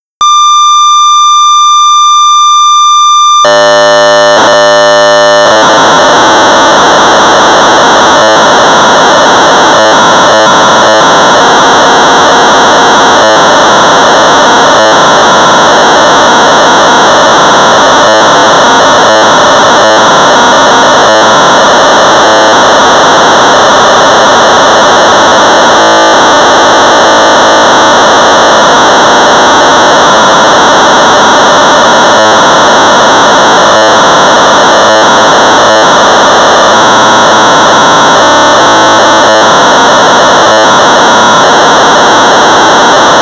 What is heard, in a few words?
Sorcerer 1200 baud; biochart; modified by I.Gillies; BASIC